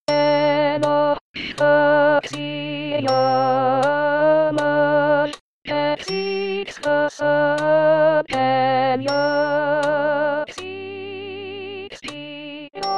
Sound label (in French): Psaume 103 Voix Soprane